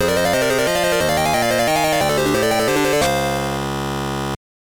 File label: Jingle, soundtrack; kikou keisatsu metal jack 1992 gb